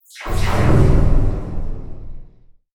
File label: Futuristic Magnetic Gate